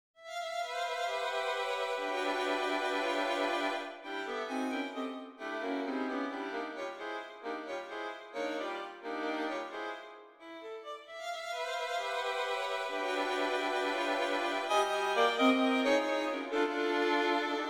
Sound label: Concertante four violins; 3. sats